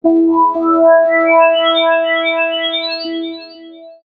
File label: alert swell ringtone